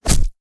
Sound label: knife stab